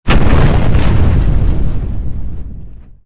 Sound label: Explosion Ship Large